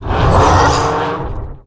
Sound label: Iron Golem Attack